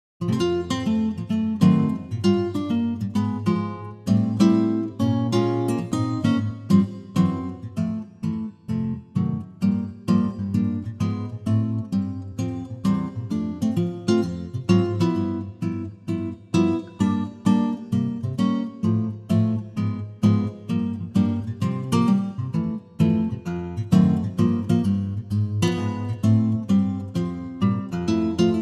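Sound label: F acoustic guitar; key - F - vocal range - A to C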